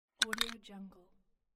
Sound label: button click